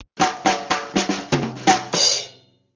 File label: Striking, Nokia; 6670